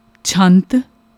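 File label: chhant, singing styles of Gurmat Sangeet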